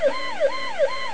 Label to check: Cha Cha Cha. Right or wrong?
Wrong